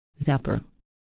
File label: zipper